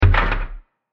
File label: Smashed Wood Impact; Bursts; Impacts; CraftedSignals